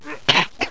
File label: COUGH; Dogz